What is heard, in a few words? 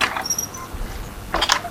shutters wood open